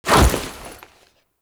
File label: Foley Melee Sweep; Warframe; Kahl